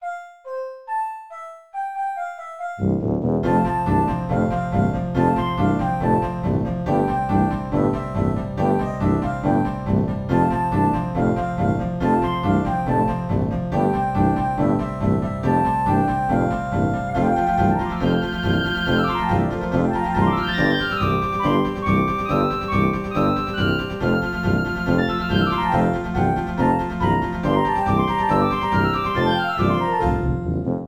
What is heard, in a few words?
Forest Welcome Song; Even the Ocean